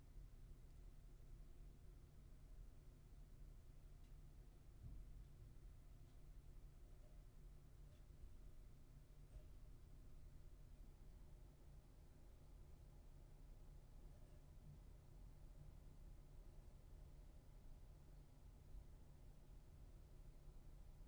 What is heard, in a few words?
room tone